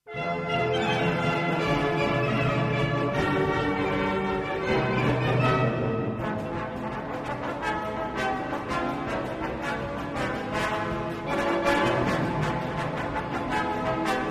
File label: Frolic For Trombones; Live In Concert